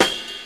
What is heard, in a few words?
dirty steel snare drum sample C sharp key